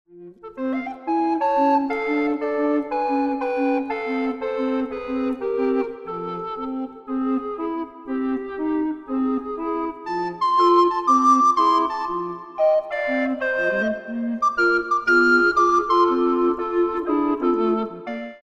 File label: Recorder Ensemble